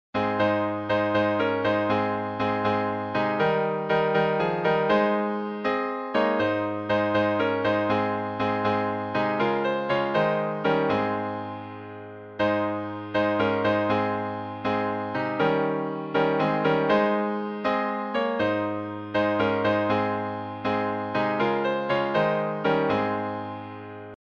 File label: Hymns